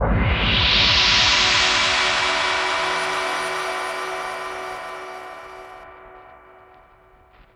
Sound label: Tonal FX E